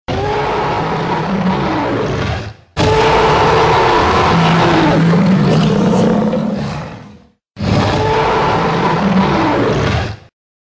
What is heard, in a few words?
Spinosaurus Roar